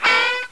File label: beep; Driver; CD Expert 39